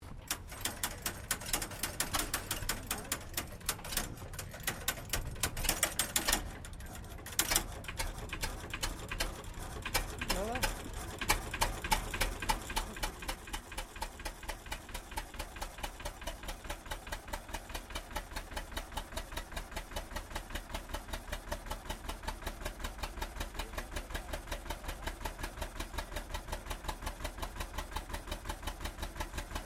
Truck Thornycroft M2 40 Crank Startup, Classic comercial vehicles